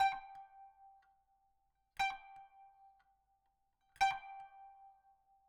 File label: kanun